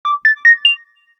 message, notifications; Tecno P3

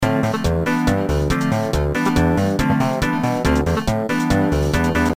Fishing Game Over; LG GD900 Crystal; Game Sound

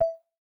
Volume control Calm

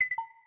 Keytone; LG GD550 Pure; Keytone sounds

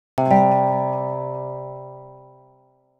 Country Guitar, notifications; Motorola Moto-G20; lenovo

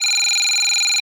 alarm, alarms; freetel

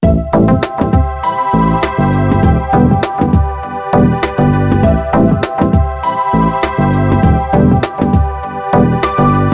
Bells of Orion; BenQ-Siemens; EL71

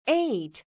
Key Tone

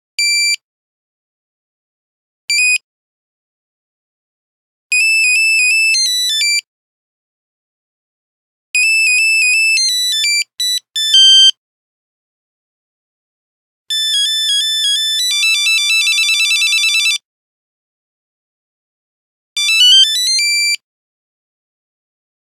Nokia Buzzer 3210 Dawn; Windows Phone apps

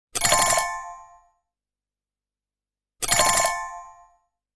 Calendar alarm, Nokia alert tones; Asha 301 Southeast Asia

